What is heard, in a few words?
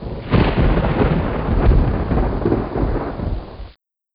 thunder; spirit2dm2